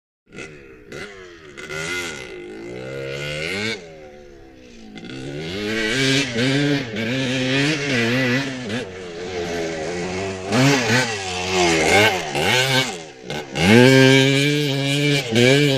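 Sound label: Stop Off Scrambles Motorcycle, 250 dirt bike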